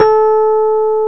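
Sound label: electric piano D5; East West; Global Sounds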